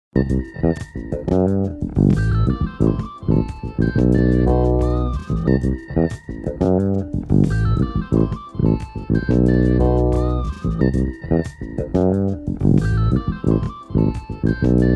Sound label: 4 Am bpm 90; Tempo réel 2 mesures